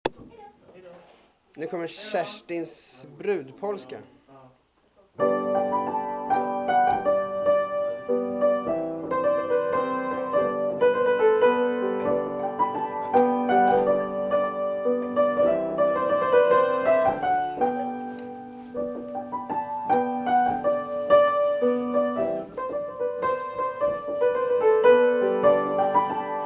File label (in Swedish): Kerstins brudpolska